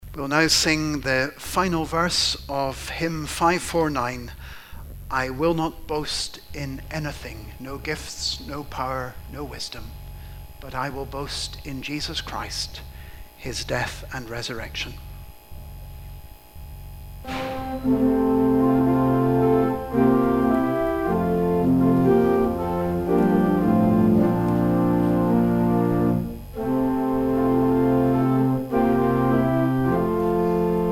hymn 549

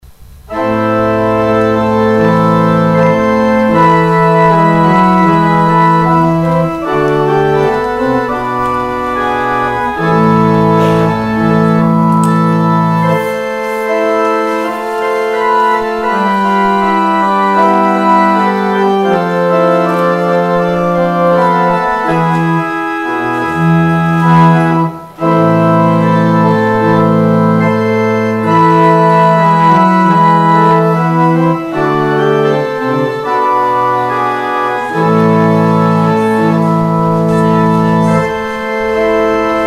organ outro; Organ exit music

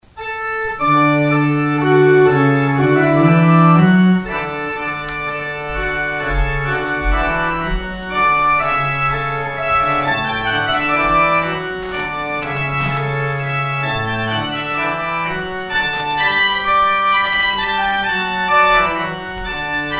outro trumpet voluntary; Closing organ music 'Trumpet Voluntary' J.Stanley; St. Mungo's

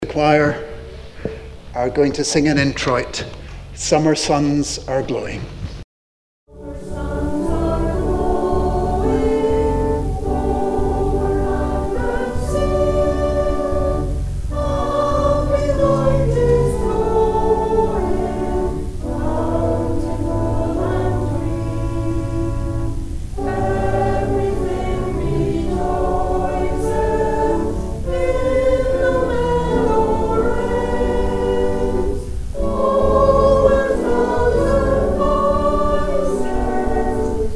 introit; Choir Introit

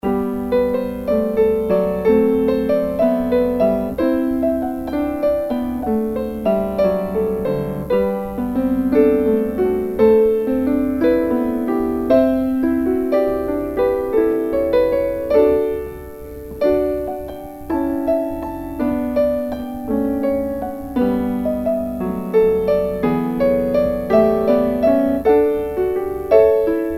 piano interlude

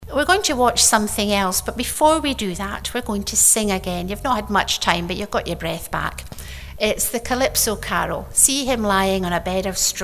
calypso carol; Christmas Eve; Calypso Caro, 'See him lying on a bed of straw'; St. Mungo's

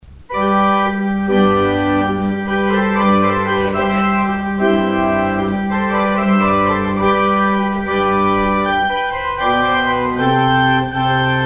organ outro

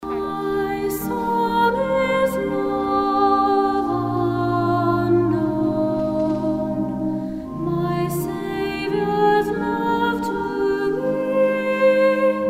offering interlude; musical interlude during the offering 'Love / unknown'

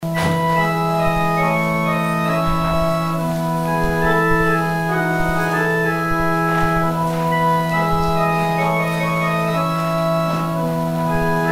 organ interlude; Organ interlude during the offering

